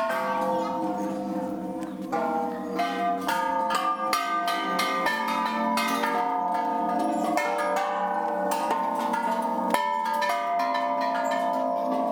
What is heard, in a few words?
Intense temple bells Doi Suthep Thailand